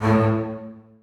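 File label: basses staccato a1; Sonatina Symphonic Orchestra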